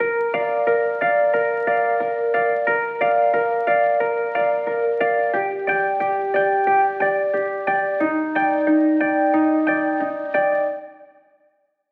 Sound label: Chords Rhythm Folk Indie; midi wizard